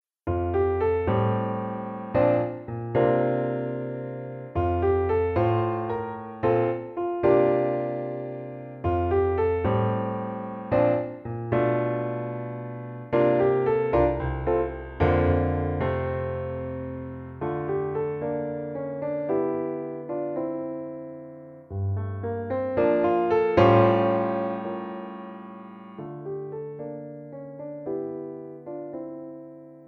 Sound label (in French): Piano seul